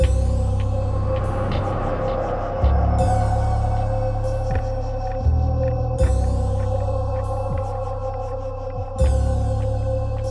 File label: Desolation; BB 2HTC Samples Vol 4